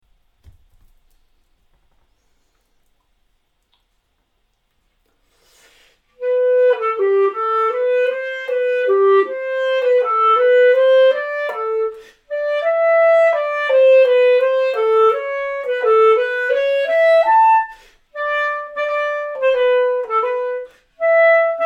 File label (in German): Klarinette